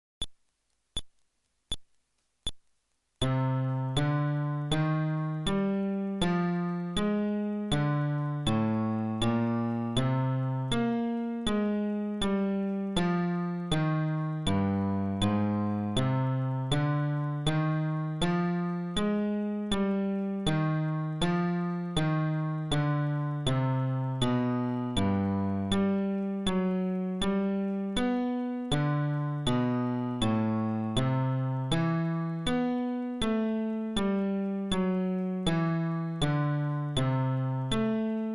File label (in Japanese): フレットレス (テンポ80)